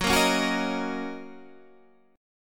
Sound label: Gb 6th Gb down; Listen to Gb6 strummed